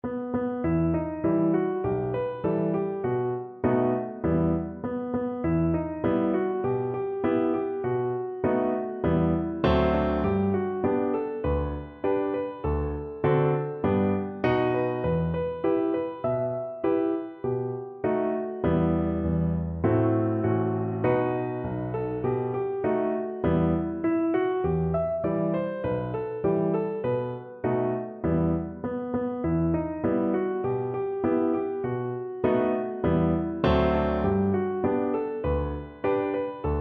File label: achtsik er PNO, piano